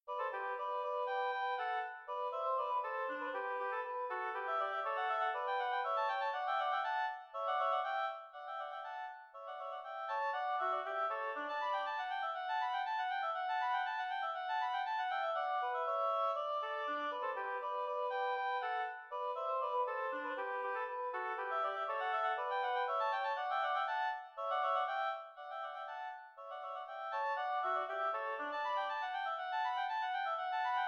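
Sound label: duet 2 oboes; molter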